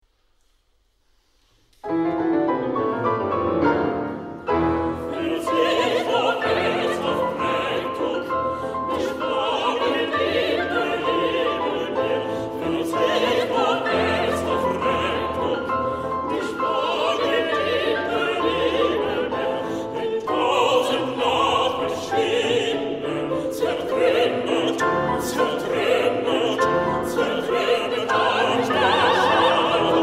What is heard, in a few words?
Chorus; brahms; neueliebeslieder